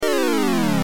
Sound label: power down